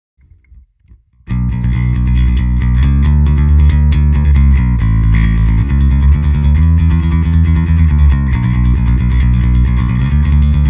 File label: Plek PF 115LF PF 410HLF